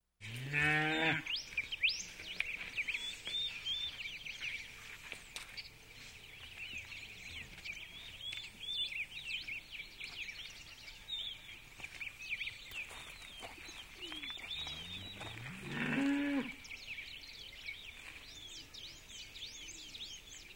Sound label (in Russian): skot pasuschijsja na lugu